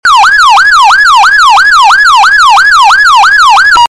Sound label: loud police siren